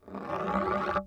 wood High Acc Medium

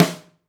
snare

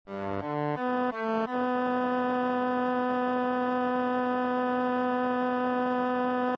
bowed

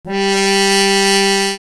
Hand Mute g3, horn; dsp2008 sound library

